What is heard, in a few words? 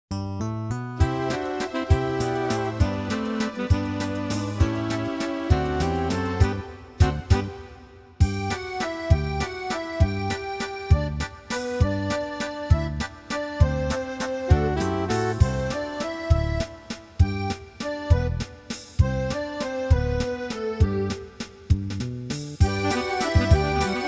Instrumental; Conte musical